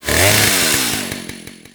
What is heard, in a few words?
Ghoul Sawman; Warframe; Grineer Ghouls